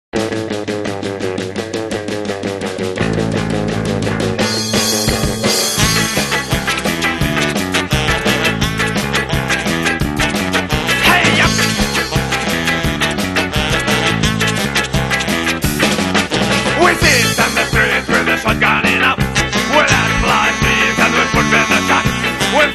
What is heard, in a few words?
ska